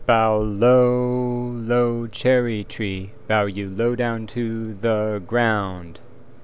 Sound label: Bow Chanted